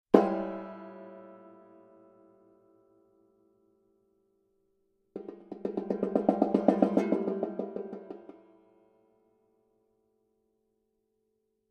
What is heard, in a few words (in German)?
tambura dissonant, banjo